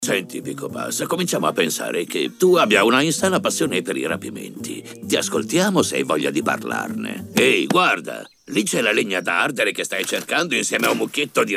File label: Boxy Bass